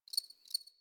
Insect Day

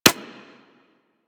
Bullet Impact Generic; Surface

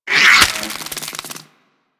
Devour Enemy Bite; Warframe; Mummy

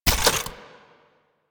Long Gun Charge Stop; Warframe; Thano Tech Arch Long Gun

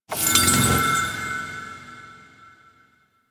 Bridge Collapse; Ambience; Warframe; Cephalon Weave; DE

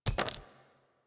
Flak Cannon Shell Impact; Warframe; UT Flak Cannon

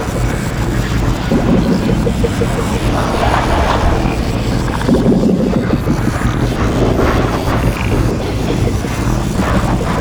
Brain Transfer Device; Props; Ambience

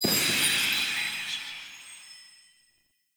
Lore Collected Spark Burst; Objects; Energy Restores

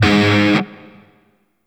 Shawzin Oct One G Sharp; DE; Warframe; Grineer Shawzin

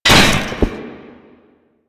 Umbrella Stand Destroy; Warframe; Nineteen Ninety Nine

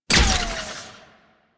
Glyph Prism Hit; Warframe; Duviri; Glyph Puzzle

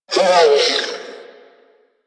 Repair Drone Death; Necramech; Warframe

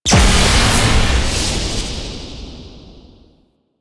Void Dragon Orb Proj Explosion Lightning; Enemies; Abilities; Warframe; Duviri

